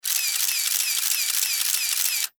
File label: 35mm camera shots